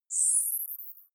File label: navigation point